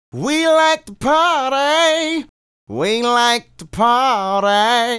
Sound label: Male Solo, Hip-Hop and RnB Vocals